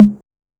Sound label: Tom Hi, 606; classic machines